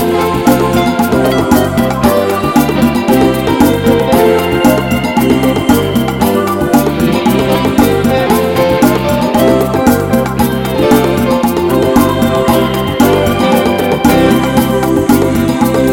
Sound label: Hot Samba